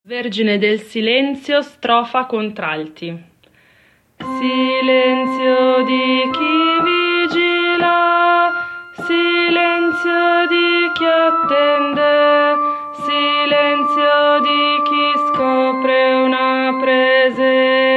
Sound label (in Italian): strofa contralti